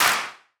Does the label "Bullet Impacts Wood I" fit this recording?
No